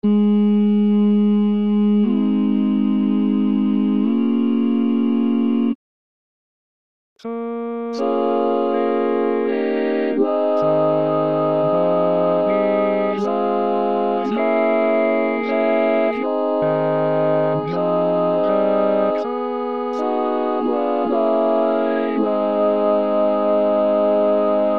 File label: Lead, barbershop